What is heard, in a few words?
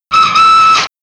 steam